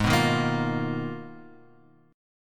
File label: G sharp Minor 6th Add 9th; G#m6add9 Chord {4 2 1 3 X 1} strummed